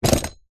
sport body builder belt set down

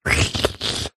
human mouth slurp lips; zapsplat

